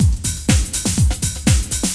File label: drum loop